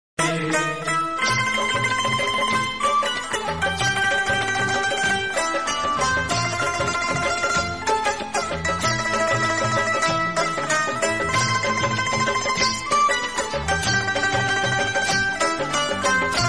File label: instrumental ringtone desh bhakti ringtone